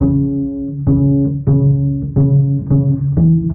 bass, Loops

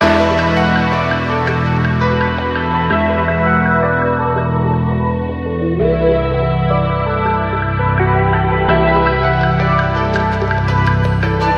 keys, Phonky loops

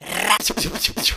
brrrap pshew pshew pshew

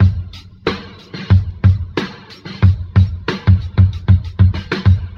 Drum loops Boombap

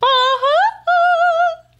"ah ha ahh", SUNG

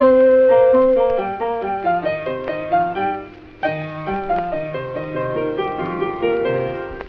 piano, loops, phonky

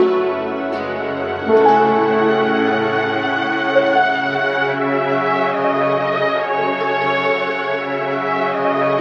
piano and strings, Sad; loops

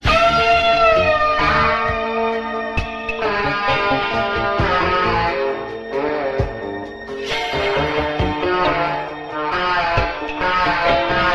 guitar, Phonky Loops

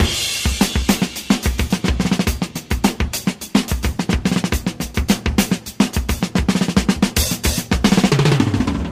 Drum loops, Rolls

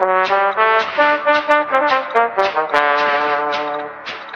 horns spanish, phonky loops

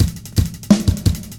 fast hats, Boombap drum loops

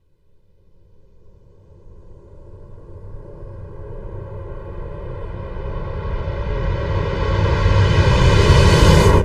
come, swish percussion

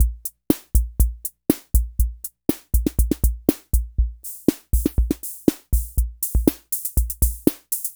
rock, Drum loops, Electro

